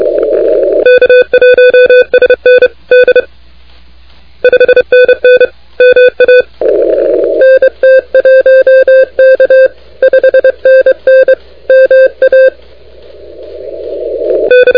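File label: continuous wave 28MHz; ARRL10M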